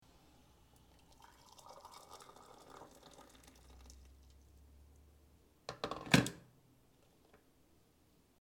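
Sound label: poured water from an electric kettle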